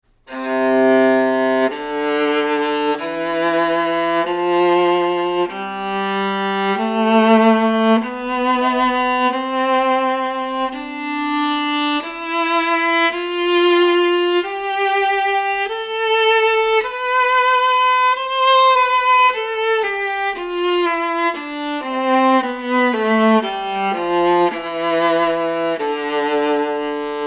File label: Viola